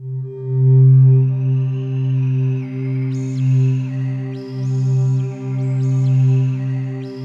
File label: PAD, ATMO PADS; Best Service; XX Large